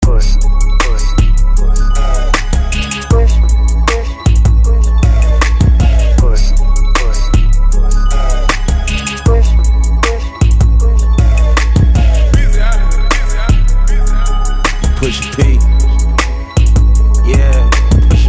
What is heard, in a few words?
redrum breaker hype 78 bpm